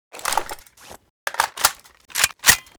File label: ak12 reload; STALZONE